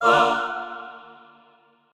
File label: chor